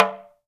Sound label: TALK DRM, Heart of Africa; Spectrasonics